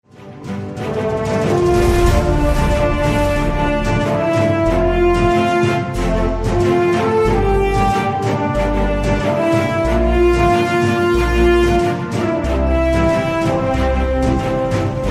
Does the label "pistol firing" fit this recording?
No